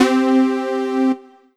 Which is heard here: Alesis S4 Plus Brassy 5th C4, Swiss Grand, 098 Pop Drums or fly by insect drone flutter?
Alesis S4 Plus Brassy 5th C4